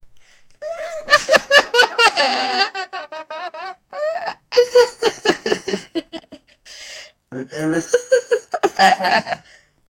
people in distress

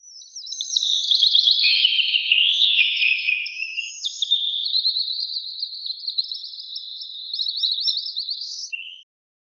forest psychodelic birds, birds singing

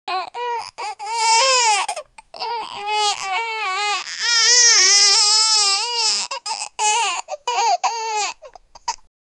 baby crying

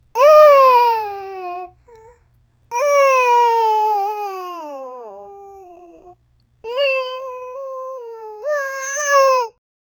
infant wailing soft hiccu

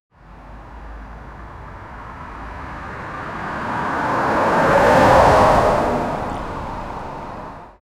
cars leaving in the, vehicles

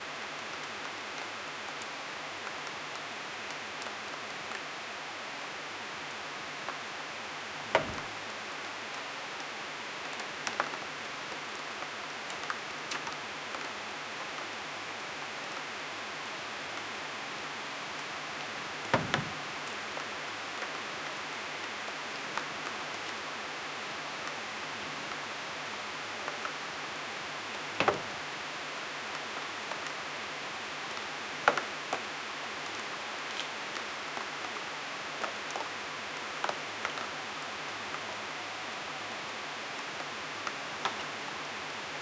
night with a rainy, wooden deck

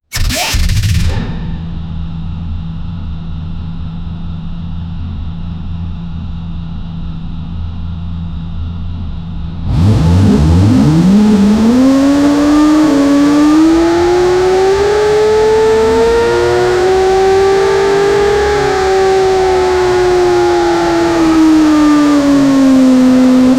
realistic field recording, hypercar

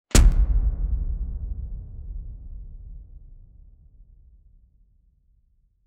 punch sound; fighting

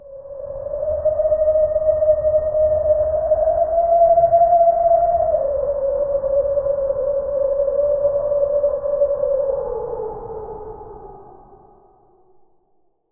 a distant eerie cry; mysterious

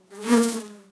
fly fly quick, insect

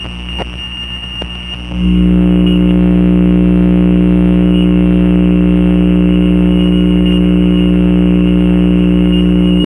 war transmission glitch, shortwave

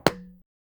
isolated pinball hitting

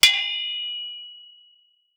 short mining sound, mining

